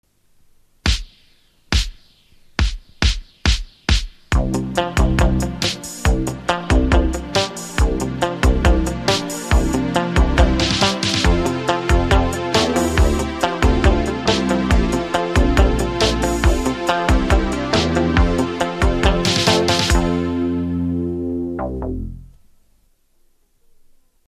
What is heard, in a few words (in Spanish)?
Careta de entrada Radio Verano Instrum